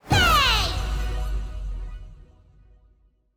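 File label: sfx general mission accomplished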